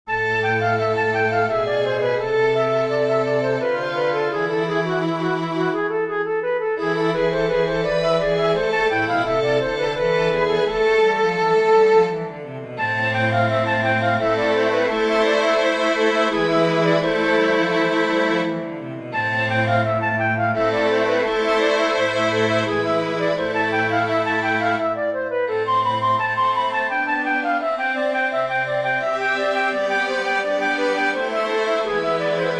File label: chorus alleluia for flute strings; bach johann sebastian